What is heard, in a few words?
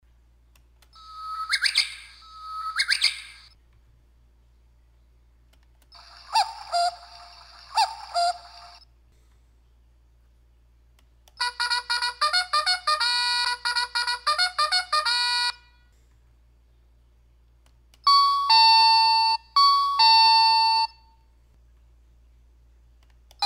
melody samples; bixolon